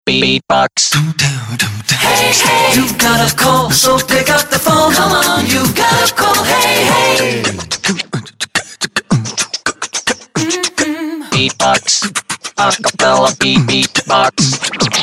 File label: Acappella beatbox, ringtones; Optimus 2X SU660; LG